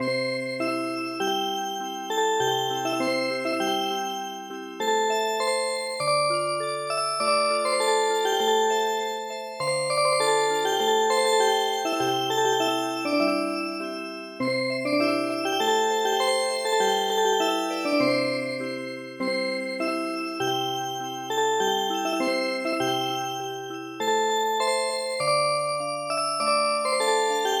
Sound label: Music Box; 河村光陽の「かもめの水平さん」に癒し系のアレンジを施したオルゴール曲を聞くことができます。「かもめの水平さん」は、ミディアムテンポな4拍子の日本の伝統曲です。歌詞は、「かもめの水兵さん ならんだ水兵さ」という出だしです。; ＰＣ ＭＵＳＩＣＢＯＸ